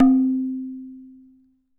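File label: TABLA left; WorldSounds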